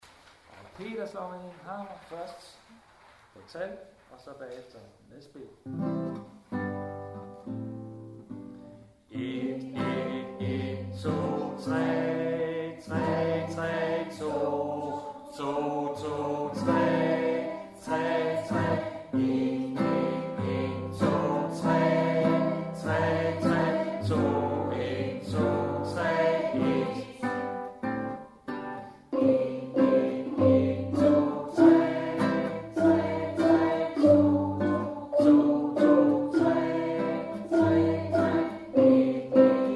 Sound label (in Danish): Sang